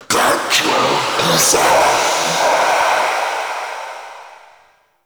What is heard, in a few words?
Male Vocal Enemy Spotted; Characters; Vocals; Mockeries